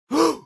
gasp; neighbor

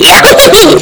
yahoo hehe earrape

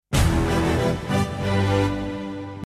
fairy epic

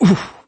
uhh

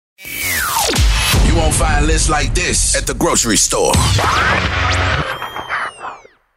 Drops

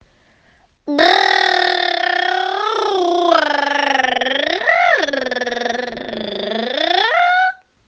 rrrrrr

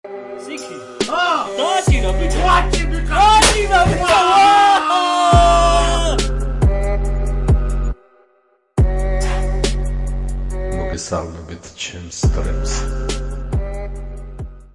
follow alert; made by sicky

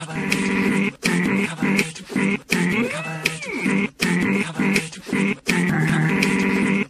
music; michael rosen

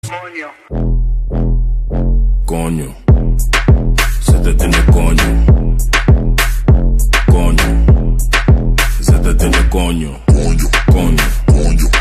tiktok remix car bass music car bass boosted

